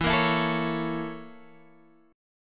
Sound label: A sharp Minor Major 7th Flat 5th